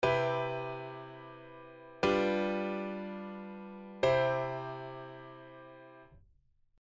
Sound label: cmaj7 g7 c